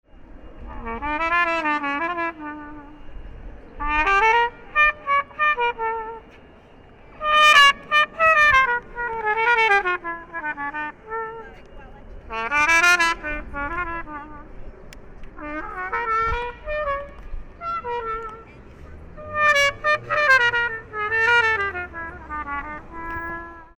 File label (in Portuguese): trompete sol registos agudos